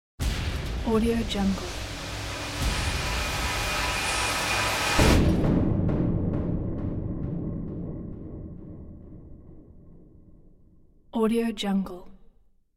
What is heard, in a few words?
dystopian storm